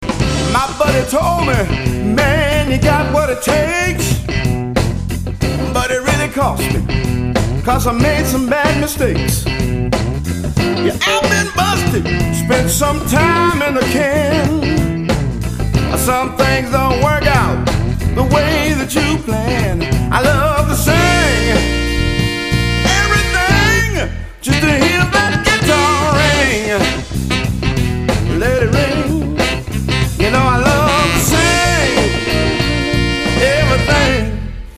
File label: guitaring